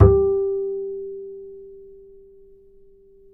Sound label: G 4, bass, acoustic fx; Spectrasonics; Bass Legends